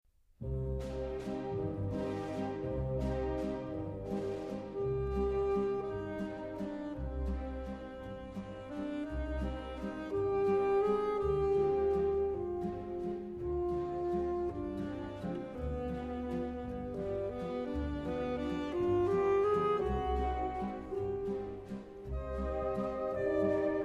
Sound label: jazz suite waltz